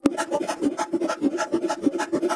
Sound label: Bongo; tsmtoolbox; phasevocoder